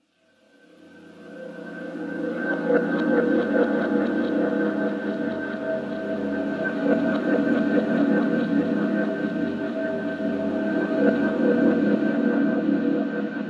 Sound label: Pad; Dubstep Sample Pack